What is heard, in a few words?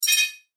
Error Tone, Error Tone Multimedia; Web Interactive; Technology; Noise; Electronics